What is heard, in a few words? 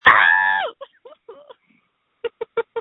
Scream